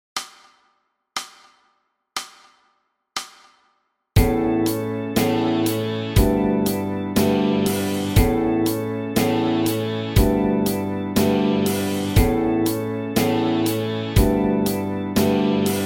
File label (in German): Rock Song D Saite